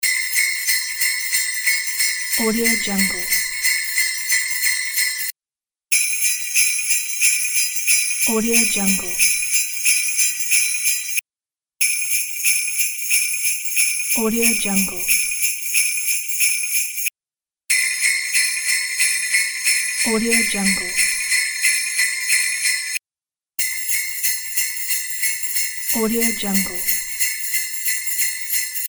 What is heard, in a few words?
sleigh bells